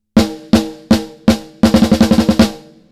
snare Pop Snare; Joe Chiccarelli

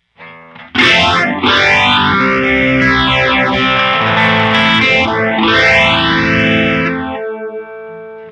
Phaser; Instant

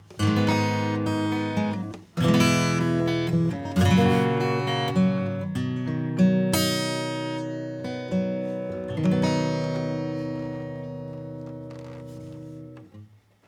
acoustic; smart EQ 3

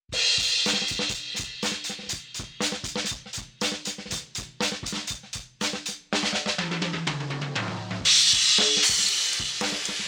Dirty Tape LOW CUT 2000Hz

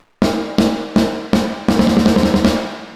HALL MODULATION; MorphVerb

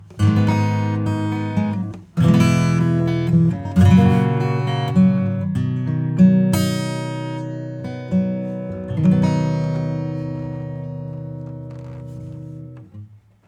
Acoustic; Reverb Compare

